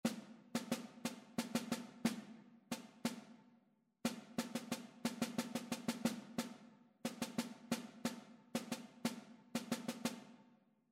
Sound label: rhythmic error detection